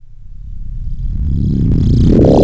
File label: REV EXPL; AKAI S-Series CD-ROM Sound Library VOL-1